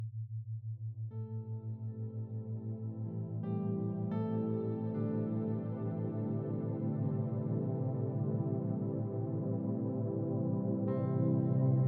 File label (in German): BB 6Hz mit Ambient Sound, binaural; Theta; Geistreise